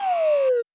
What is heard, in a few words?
wrong answer